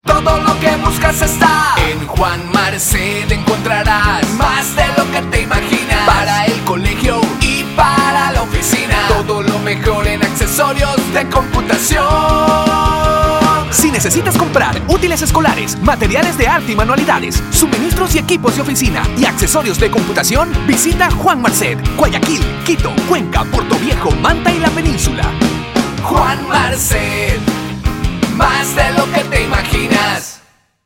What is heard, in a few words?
Jingle; Juan Marcet 2010